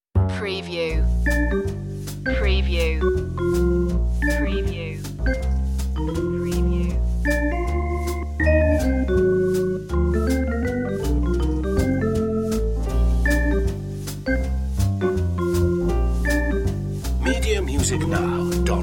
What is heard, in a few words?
jazzy room